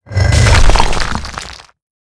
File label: stone building mid; World of Tanks Blitz